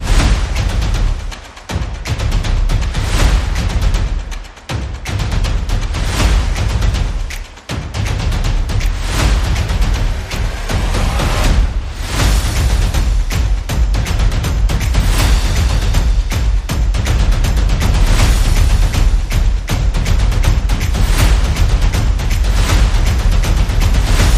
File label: Percussion and Effects